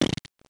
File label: splat